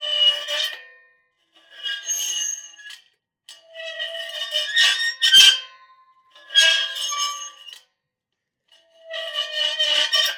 Metal Gate Spring Latch Mechanism, Screech, Squeaky Gates; DOORGate; Jake Fielding